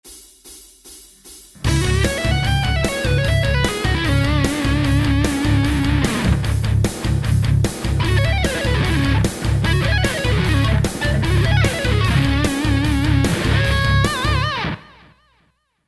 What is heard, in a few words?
Lick